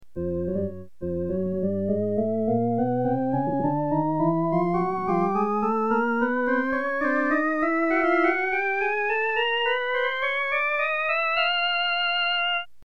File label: Flutes, Gibson organ